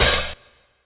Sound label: Lo-Fi Classic Open Hat; LIFEFORMS